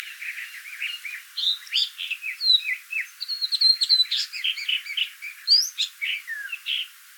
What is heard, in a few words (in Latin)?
Toxostoma cireneum